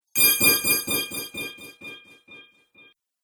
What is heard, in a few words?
Fantasy Mage Spell, magic; Magic Sound Effects